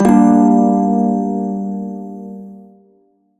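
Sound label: Shawzin Minor Chord; Warframe; Prime Shawzin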